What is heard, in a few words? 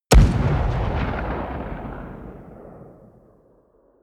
missile medium explode distant